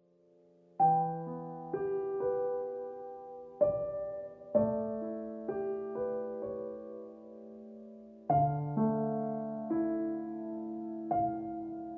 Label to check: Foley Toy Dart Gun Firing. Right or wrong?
Wrong